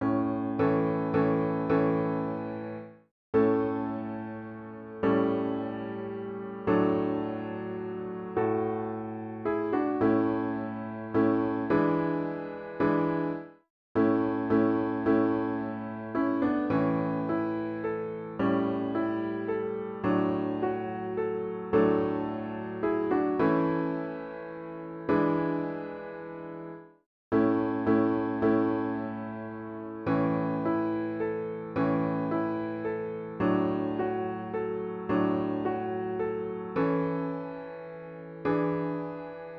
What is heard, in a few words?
piano; BELIEVE ME